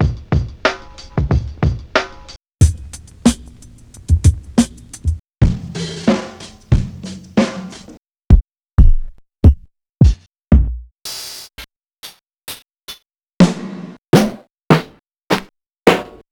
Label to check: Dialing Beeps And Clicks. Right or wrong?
Wrong